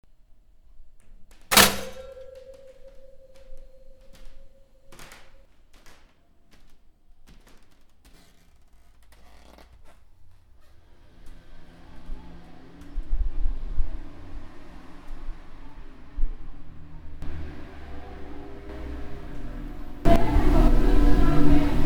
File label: house waves